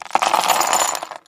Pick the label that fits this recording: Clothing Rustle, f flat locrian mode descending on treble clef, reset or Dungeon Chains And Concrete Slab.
reset